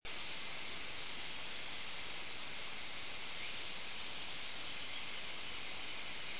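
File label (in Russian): obyknovennyy sverchok golos, grasshopper warbler voice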